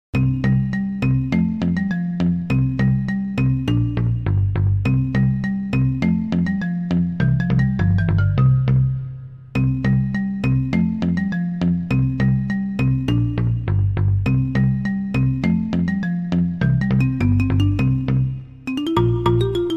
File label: Caves; Banjo-Tooie; Jinjo Village